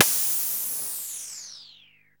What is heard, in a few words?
BLOCK; DRUMS & PERC